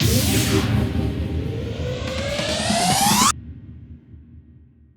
FILL, PsyTrance

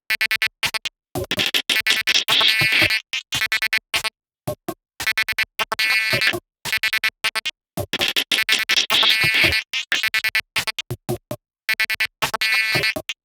Loop, PsyTrance

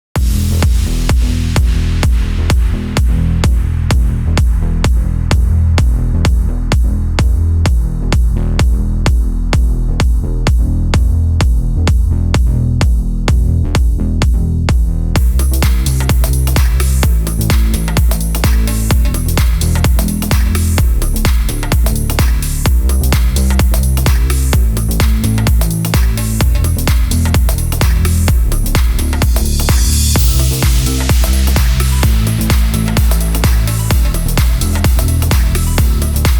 Instrumental Version

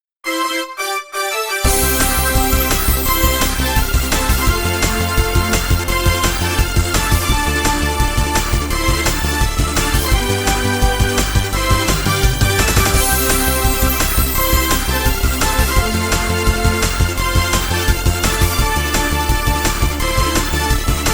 off vocal

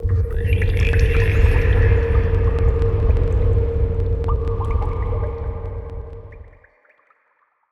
FX; LiLA'c Records PsyTrance Sounds 01

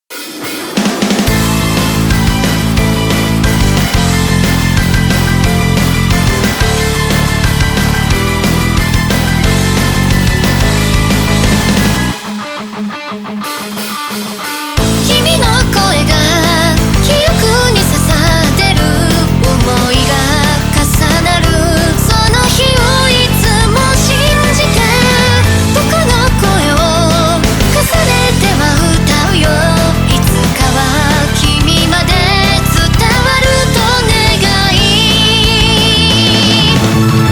wind vox

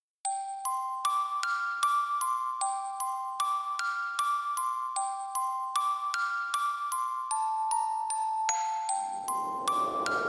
Ambient ver.

